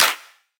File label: soft hit clap